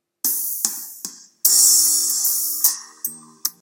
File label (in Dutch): Muziekfragment 90S